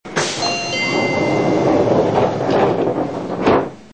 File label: door